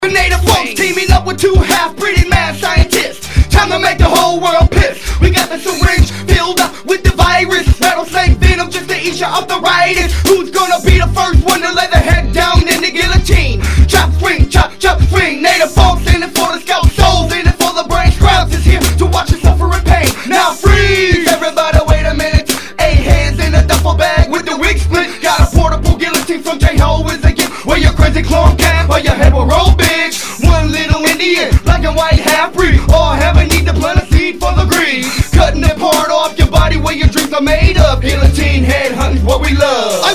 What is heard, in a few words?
native funk